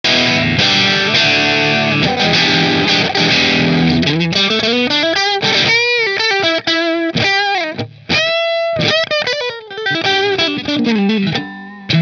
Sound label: Robin Ranger position 4 marshall, guitars